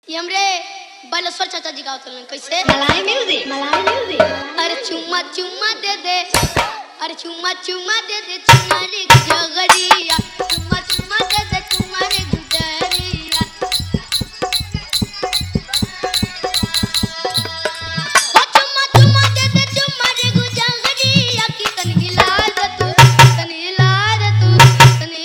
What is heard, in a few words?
Bhojpuri Remix